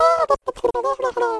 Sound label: alien